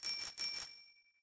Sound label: BIKBELL, residential; zones